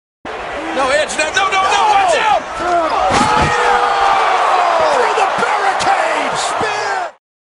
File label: spear